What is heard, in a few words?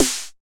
TR-909 Snare, Roland BK-5; Snares